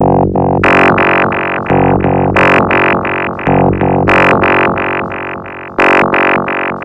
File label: Minimoog Pattern, Synths; Sonic Foundry (Sony Creative Software); Electronic Point Blank